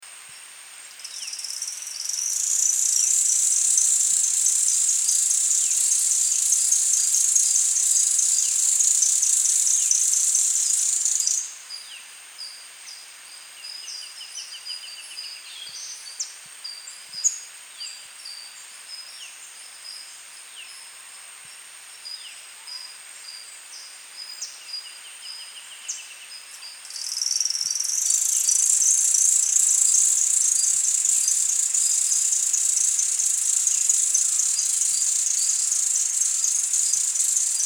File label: xenerpestes minlosi